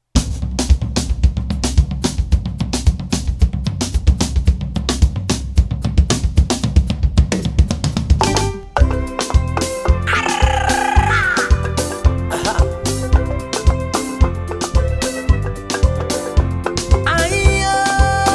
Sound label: competition song